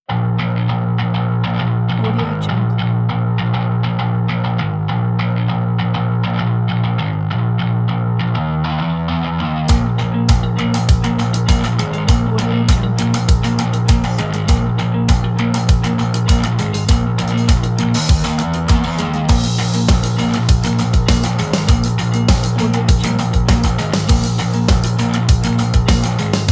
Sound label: exciting indie rock